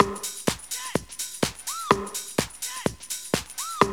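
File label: drum loop; X-Static Goldmine 3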